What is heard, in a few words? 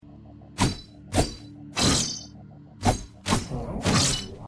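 sword no hit triple; risk of rain 2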